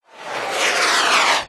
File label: bonus stopper missile drop only; Recode; rampage riches Desktop